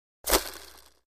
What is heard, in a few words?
Roller Blade Spin; roller blading